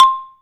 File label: XYLO C 2; AKAI S-Series CD-ROM Sound Library VOL-7